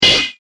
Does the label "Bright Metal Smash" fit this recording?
Yes